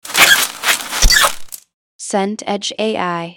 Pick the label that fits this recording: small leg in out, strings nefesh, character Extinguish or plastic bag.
plastic bag